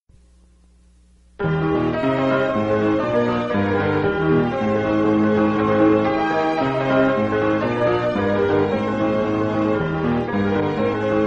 Instrumental

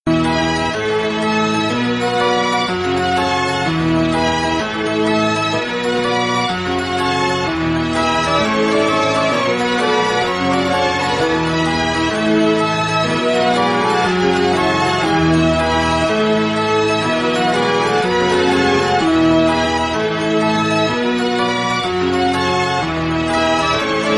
Instrumental

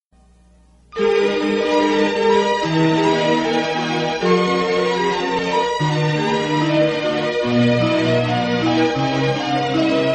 Instrumental

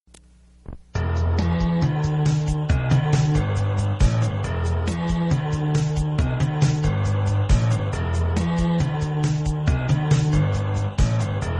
Instrumental Happy

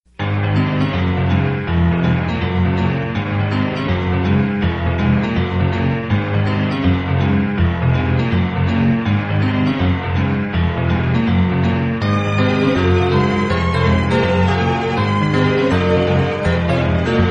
Instrumental Bounding

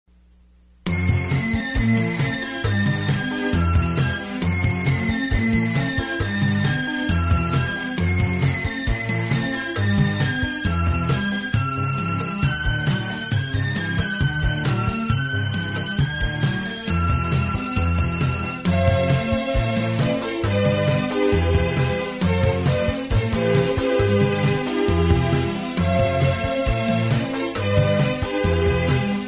Instrumental

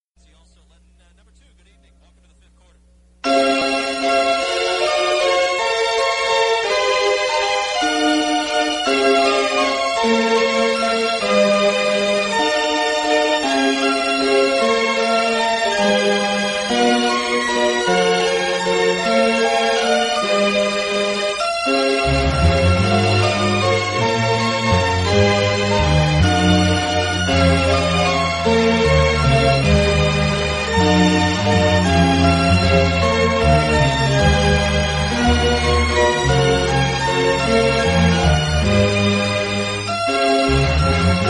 Instrumental Celebration

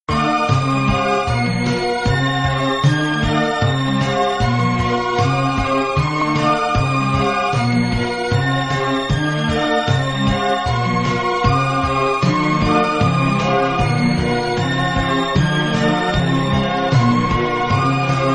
Instrumental

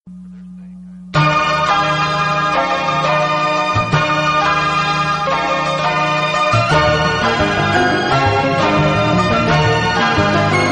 Instrumental

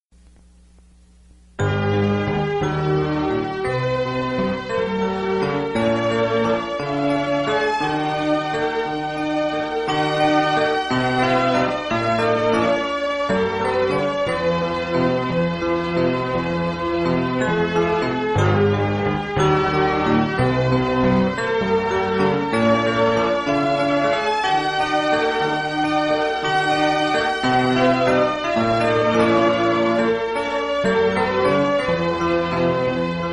Instrumental Longing

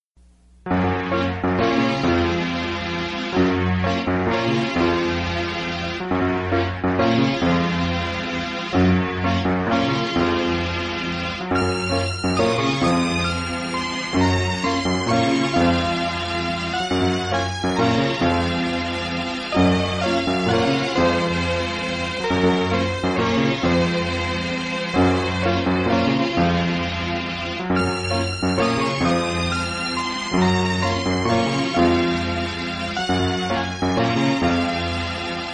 Instrumental Gymnopedie

